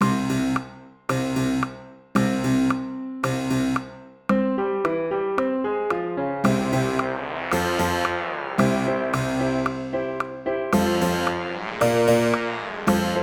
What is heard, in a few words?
range tenor; tenor trombone; Range Stretch; First Habits Warm-Up; Tempo = 112